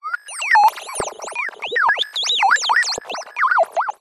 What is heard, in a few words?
science fiction robot chirps vocal beeps; zapsplat